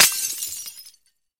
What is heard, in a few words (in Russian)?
otdalyonnij zvuk razbitiya pustoj steklyannoj butilki